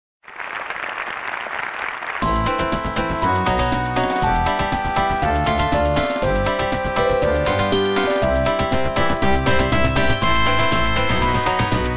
Wedding march

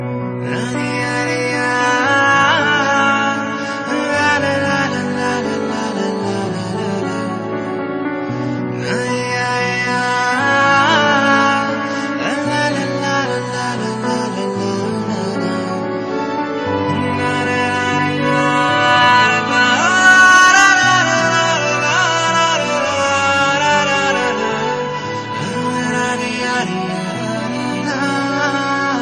Slow Tone

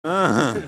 Short Laugh; Kawhi; meloboom

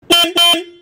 VW Beetle Horn

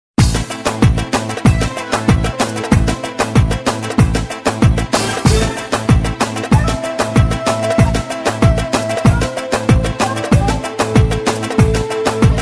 Uyghur Music

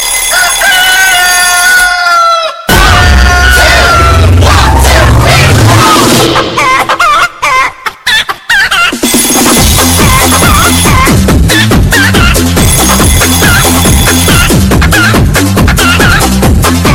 Funny Alarm

